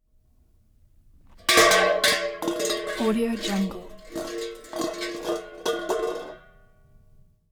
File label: 1964 cadillac